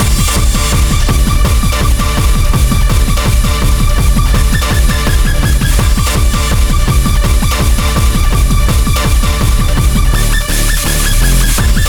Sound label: Club Music High Intensity; Bottow Dweller; Drug Wars